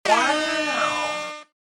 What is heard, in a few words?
horn